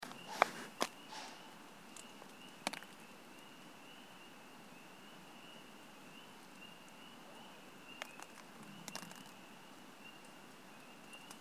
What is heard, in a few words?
Snorting deer